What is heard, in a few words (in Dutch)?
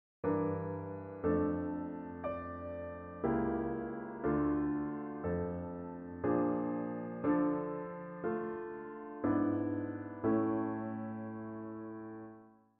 gealtereerd, gealtereerde akkoorden